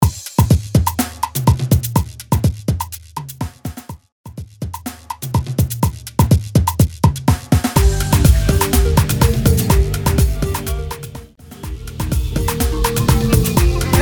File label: Remix Intro Outro Dirty 124 Bpm